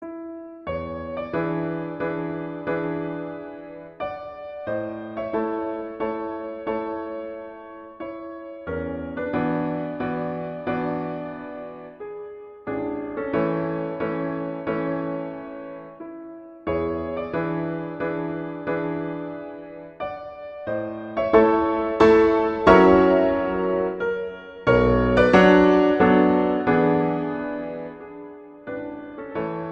in A major, Andantino; Chopin; Preludes

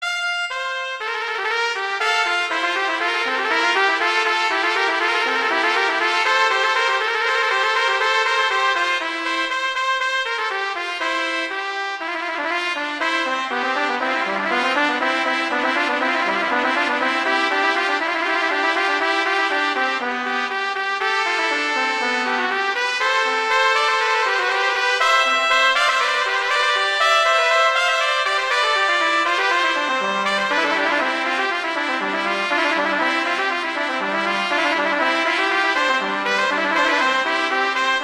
Allegro; Telemann; Canonic; Sonata 2

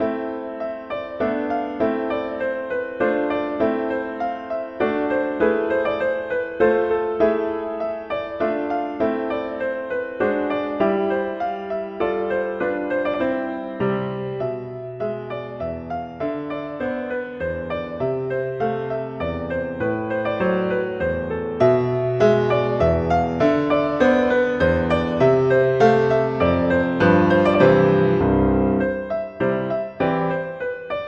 Spring Dance; Grieg; Norwegian

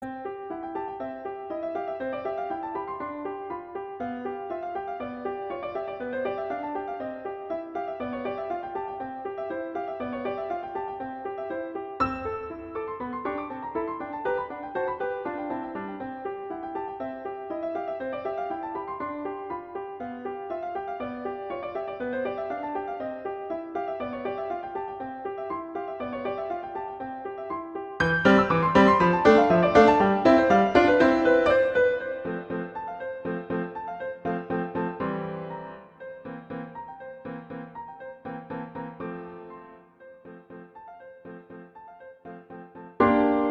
Rondo grazioso, Allegro; Sonatina; Lichner; Op4 No1

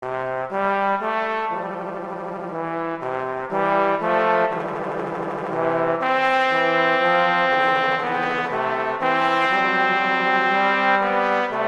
Larghetto; Telemann; Canonic; Sonata 2

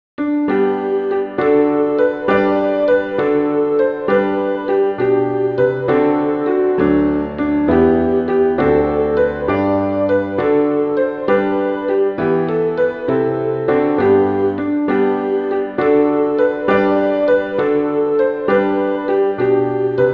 I Saw Three Ships, Christmas

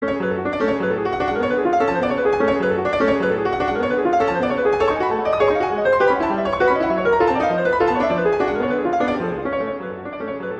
in F sharp minor - Molto agitato; Chopin; Preludes

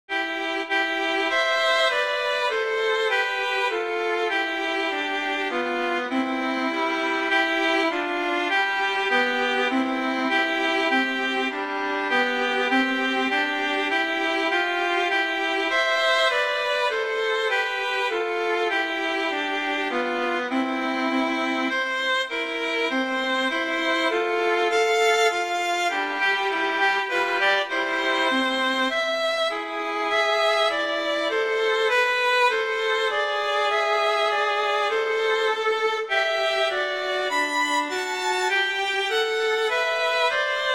Minuetto Allegretto, Duets; Mazas; Duet 1

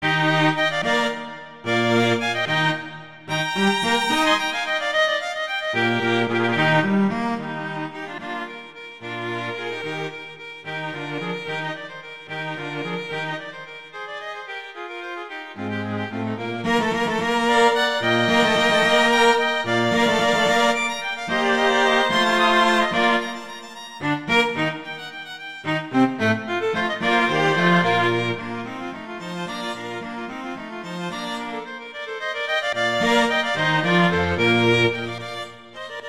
Quartet, Allegro molto; Haydn

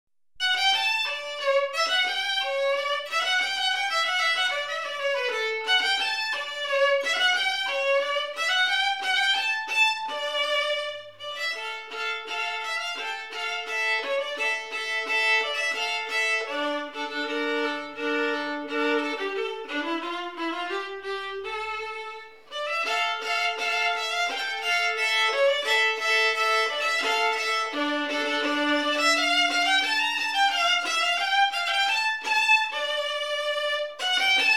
Melody, Violin; Fitzpatrick; Violin Melodies

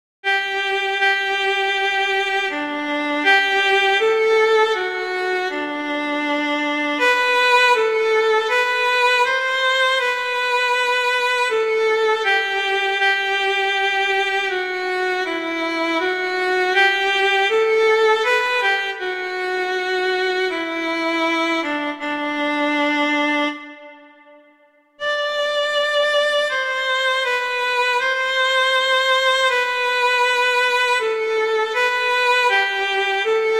O Come All Ye Faithful - Adeste Fideles; Christmas Beginners

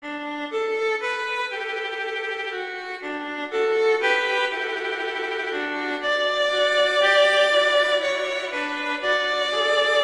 Larghetto; Telemann; Canonic; Sonata 2

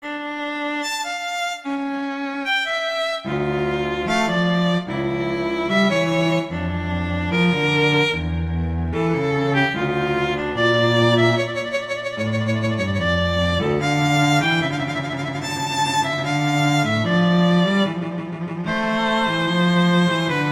Adagio; Telemann; Canonic; Sonata 1

